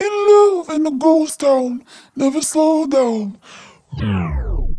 速度ダウン

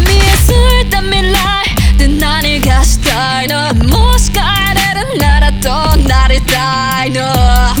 ハモリなし

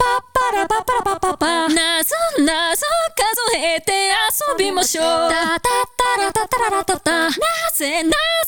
コーラス＋ボーカル

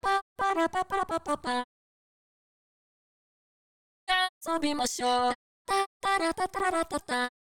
コーラス

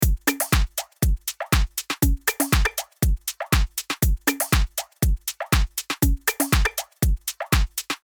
グルーヴON

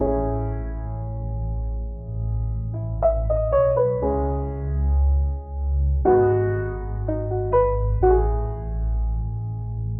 ピアノ現キー

